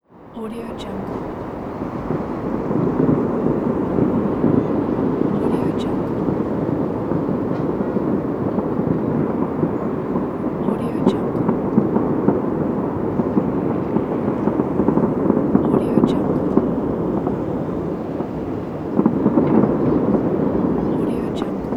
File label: distant fireworks on the background of the evening city